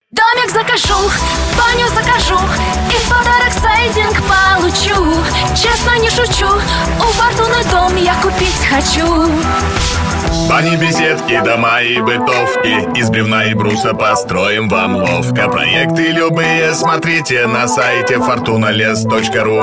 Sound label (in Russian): vokal